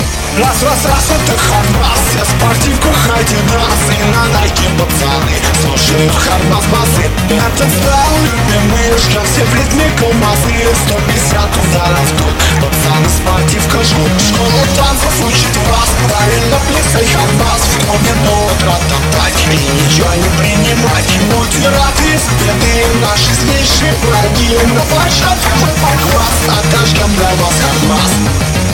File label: bad hard bass